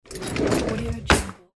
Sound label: kitchen drawer; domestic sounds